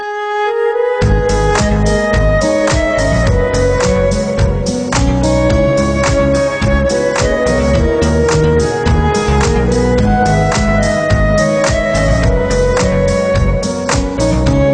instrumental

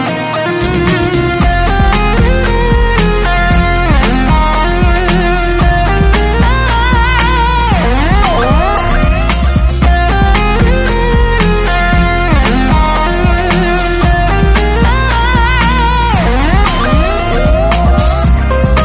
guitar ringtone

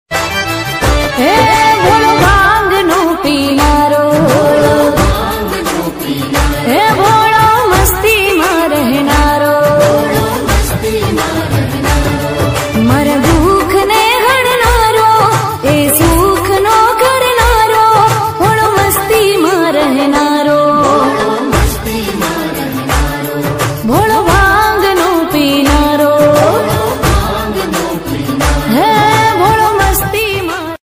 Gujarati song, shambhu dhun

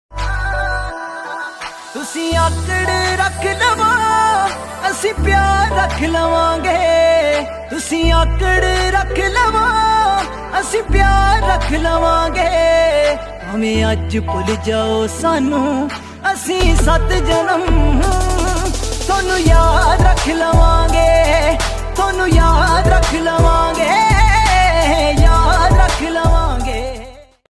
punjabi song ringtone

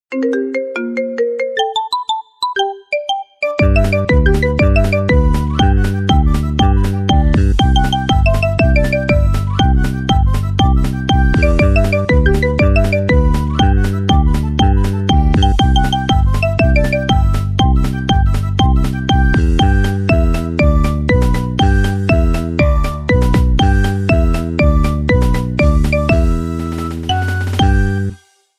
marimba remix

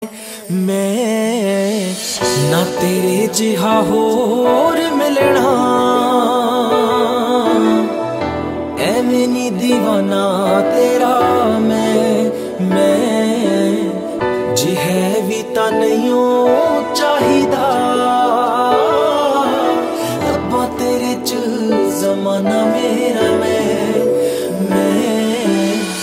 song ringtone

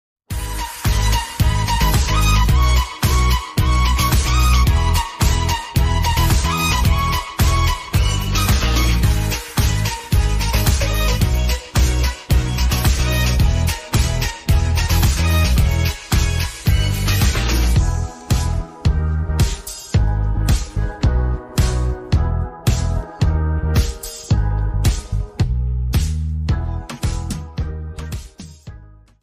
instrumental

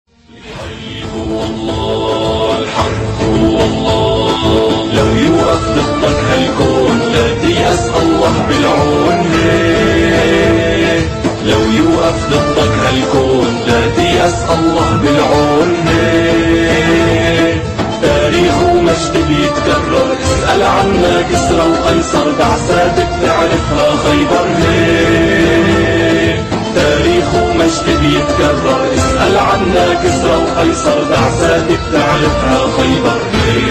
ringtone

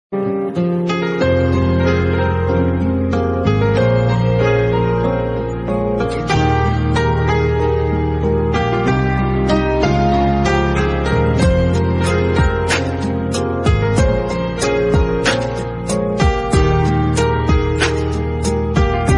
instrumental ringtone

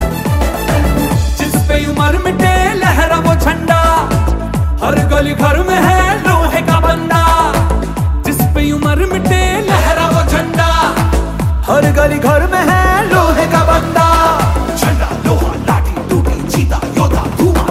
telugu ringtone; rrr

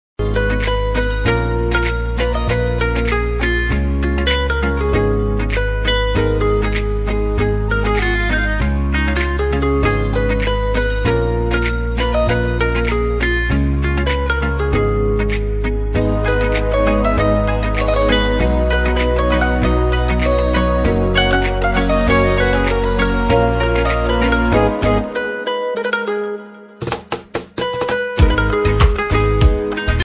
romantic ringtone hindi ringtone

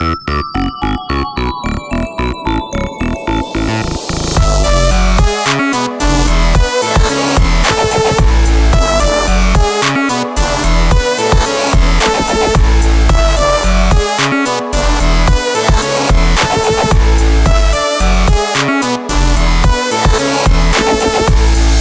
nokia glitch hop remix

